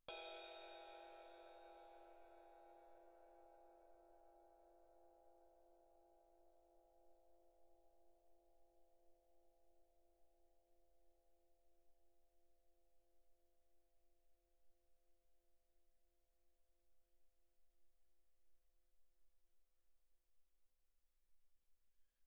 ride stick normal mf, suspended cymbals; Percussion

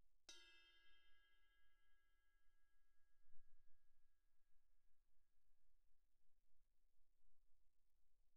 crash stick bell pp, suspended cymbals; Percussion

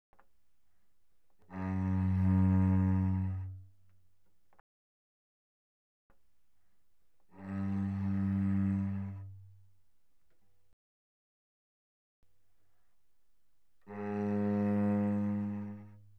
Cello arco pp sul C Gb2D3; Strings

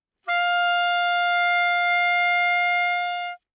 Alto Sax No Vib ff F5, Eb Alto Saxophone; Woodwinds; MIS Pitches - 2014

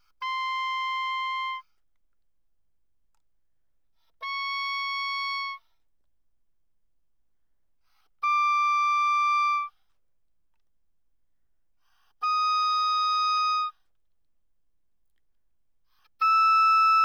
Oboe ff C6 Ab6, woodwinds

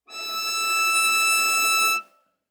Viola arco ff sul A E6 stereo; MIS Pitches - 2014; Strings

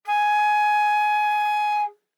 Flute nonvib ff Ab5 stereo; Woodwinds

